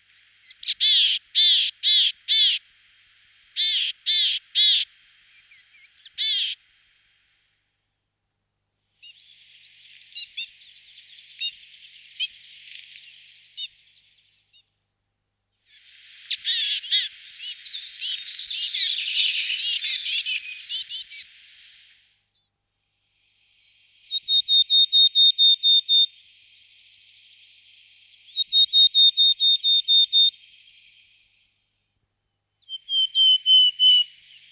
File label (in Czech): Sykora luzni alpska Parus montanus montanus